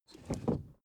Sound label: suv idle 1st gear change